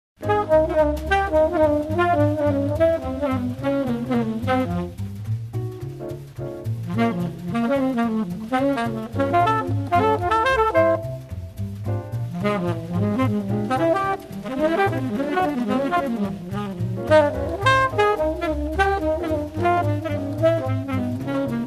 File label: Background Music